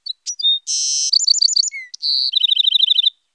bird; de losttemple

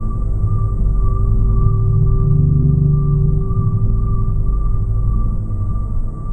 citadel drone loop; cstrike; niukkis

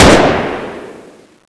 turret; cstrike

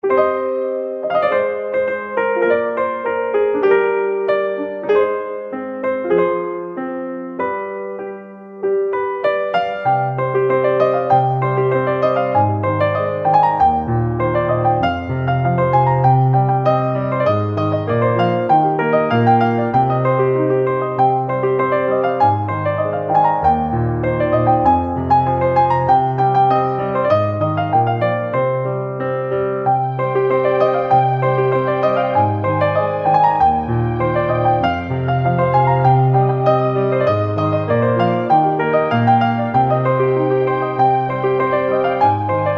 oldschool piano; cstrike

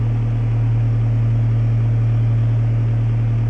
ventilator; cstrike; kzra wired ez

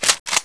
M14 draw, weapons; cstrike

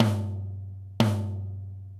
drums; jb snow

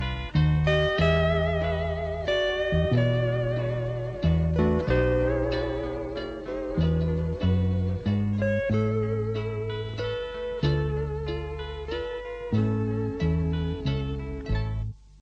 hawaiian; bikibot

